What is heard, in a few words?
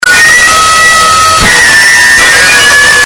dancing rat jumpscare; homoween; jumpscares